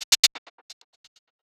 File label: Gated Cymbal; musicradar